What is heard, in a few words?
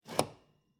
Cabin, outer doors, double doors, tension, latches, wooden, screens, slide bolt, hyper; Eiravaein Works; Vaeyan IV